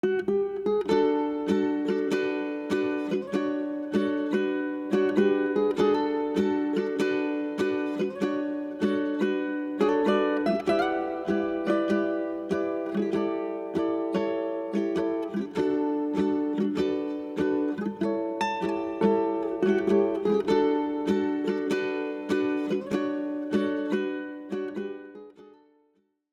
Private Beach; PAPER MARIO Color Splash; wiiu

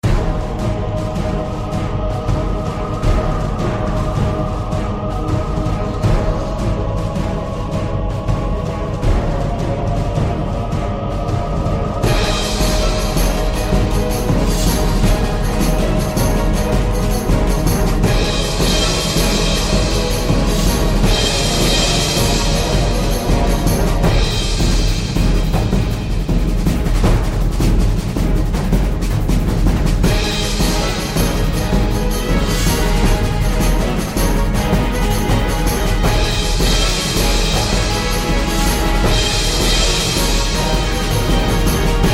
Battle; RPG Maker; Legend of Heroen v1.20

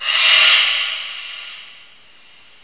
Call; RPG Maker; Legend of Heroen v1.20

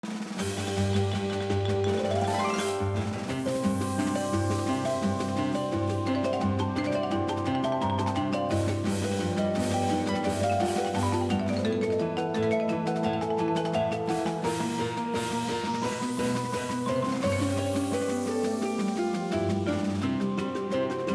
Water; PAPER MARIO Color Splash; wiiu

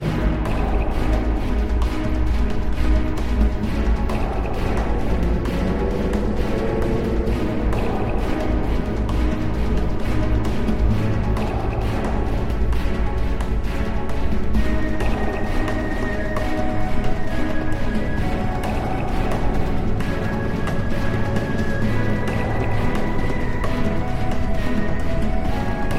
MUSIC INSECT NEST; RPG Maker; Legend of Heroen v1.20

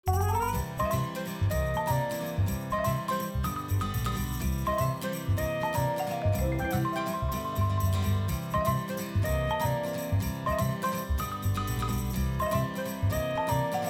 BGM Professor; PAPER MARIO Color Splash; wiiu

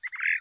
bird; RPG Maker; Legend of Heroen v1.20

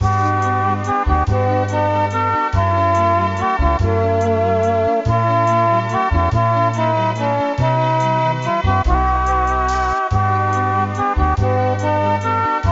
House; RPG Maker; Legend of Heroen v1.20

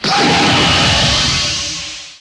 spirit bomb fire; DBZ

